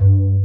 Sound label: LIGHTHARP, JV 1080; Pro-Rec - Synthsonic